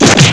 kick hit

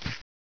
gator footstep; gearbox

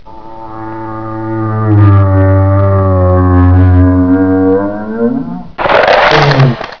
attack, ambiance; svencoop; rekin

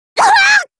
Hurt, voice commands Anime Loli; svencoop

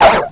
pit drone pain; svencoop

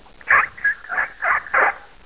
raptor idle chitter

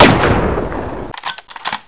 m40a1 fire; svencoop; weapons

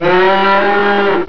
garg alert; svencoop; babygarg

